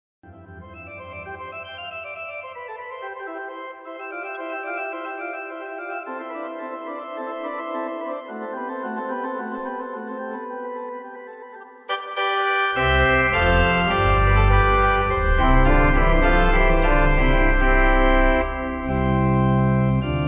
nord churchorgan

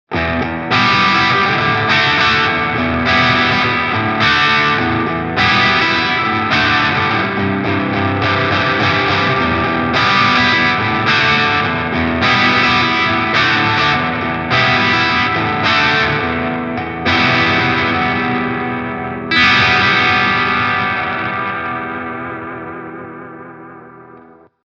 Surfybear Classic Reverb crunch; Surfy Industries; Tone 3 Mix 5 Dwell 5

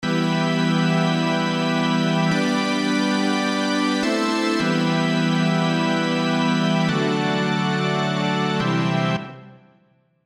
Synth Reverb

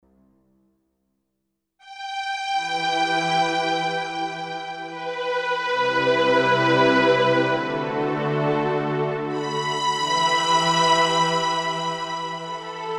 Kawai ES8 Slow Strings

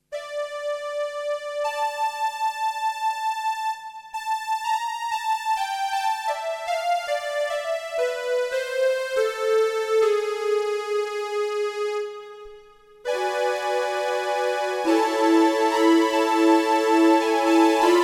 Strings

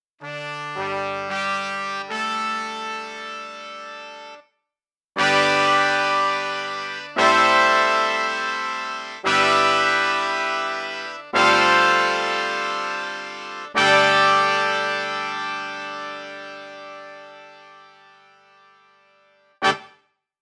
Kurzweil PC4 Brass

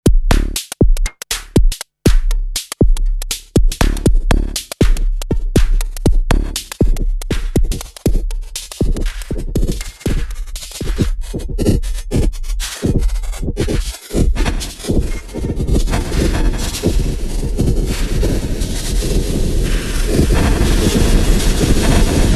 volca drum Grain

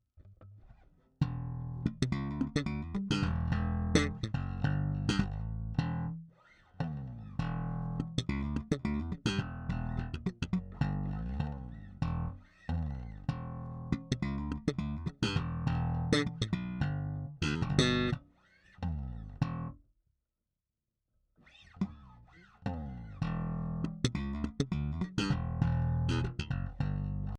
Jbass slap neutral; HB Block 800b

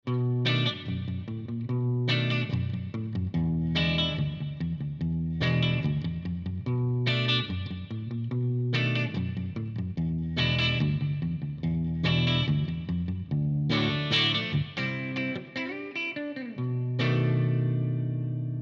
Vox NT15 Clean Dark

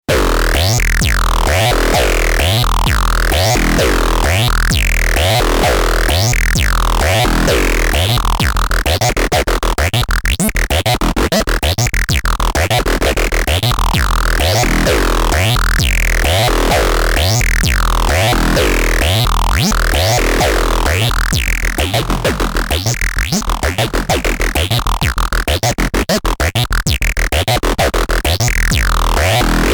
Envelope Warp CV; Xaoc Devices Sofia

